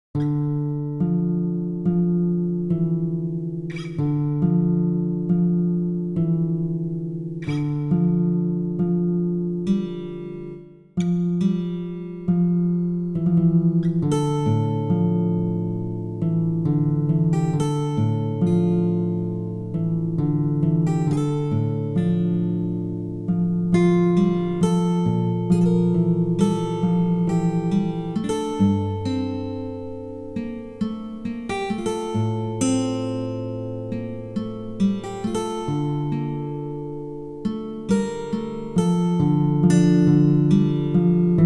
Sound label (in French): version guitare